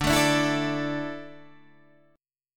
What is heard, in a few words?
D Suspended 2nd D down, chord